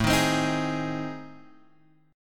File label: A Minor Major 7th Flat 5th, Guitar Open G